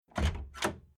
Household Door Small Door Open Latch Sound Two Parts; Miscellaneous Door